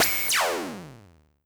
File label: laser gun